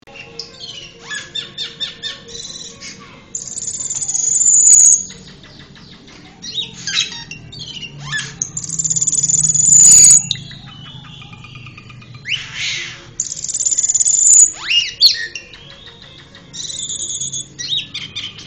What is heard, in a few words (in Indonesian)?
cucak keling